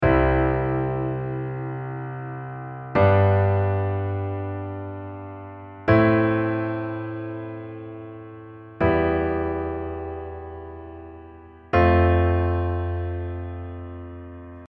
white note a, accompaniment pattern

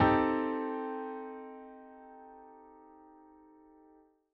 tetrad m6

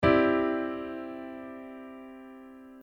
add9, accompaniment technic

